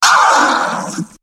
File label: Scratch Sample, DJ; DJ Debut